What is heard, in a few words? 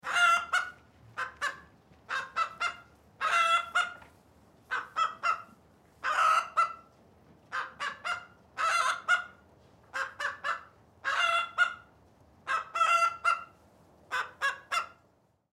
Chicken Caw Cluck, Chicken Caw Cluck Bark Several In Barn; Farms; Farm Sound Effects; Animal